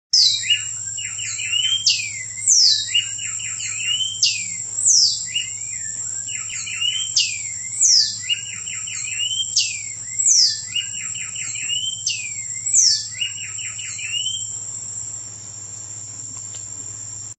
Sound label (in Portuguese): canto do garrinchao coraia